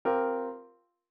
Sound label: C7sus4